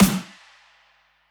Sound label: snare real long verb